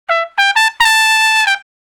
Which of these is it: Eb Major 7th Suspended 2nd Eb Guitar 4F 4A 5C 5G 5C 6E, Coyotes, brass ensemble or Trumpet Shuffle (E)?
Trumpet Shuffle (E)